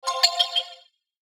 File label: SHIMMER HITS, Shimmer Hits Rhythm; Blastwave